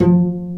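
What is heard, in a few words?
String, contrabass pizzicato; String Master Series; Cb Pizzicato; Cb Pizz 3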